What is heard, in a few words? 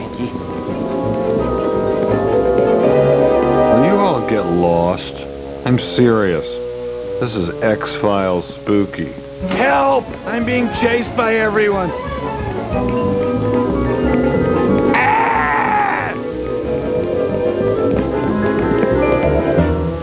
spooky